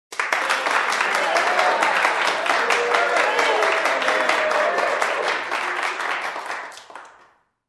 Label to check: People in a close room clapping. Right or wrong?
Right